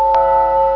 Cloches; Mosaïcon

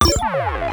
echec; MOBICLIC41

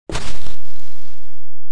pas chro sable; WehikulCzasu; Time Machine; Bruitages

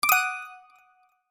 ROLL ETOILE

